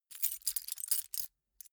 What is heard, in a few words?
key ring mid size rattle; objekte; schluessel